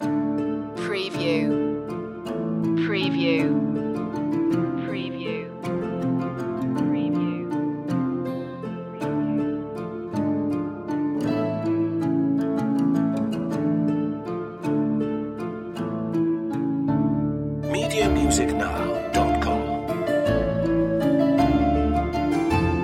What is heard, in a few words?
no percussion